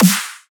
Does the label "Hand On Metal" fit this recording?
No